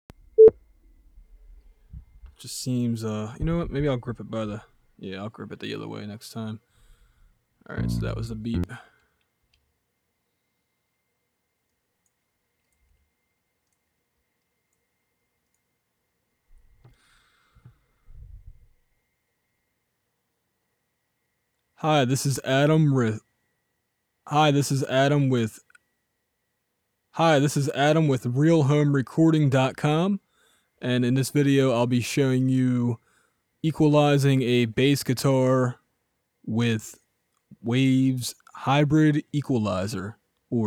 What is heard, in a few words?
wavesh eq bass